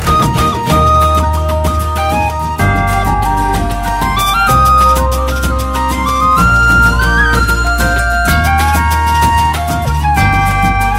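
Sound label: BG Music Telugu Ringtone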